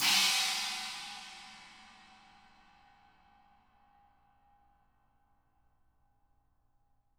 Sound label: CHINA 20, CHINA CRASH; ILIO; Double Platinum Drums 1